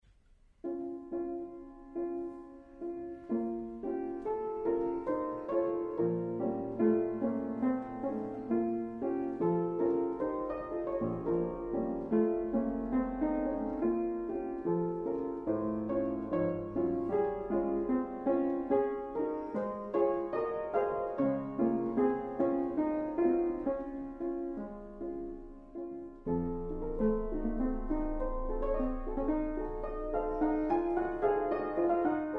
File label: Kanon Canon